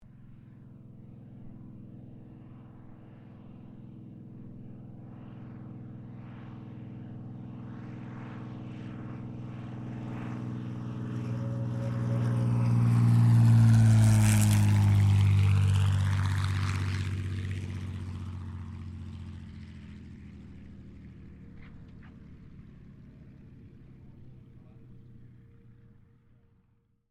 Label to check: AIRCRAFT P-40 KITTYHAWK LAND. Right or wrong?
Right